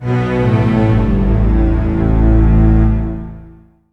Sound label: movie synth